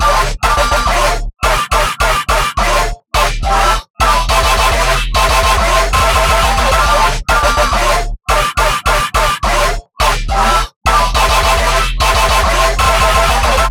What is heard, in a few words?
Goofy Cooler Bass sub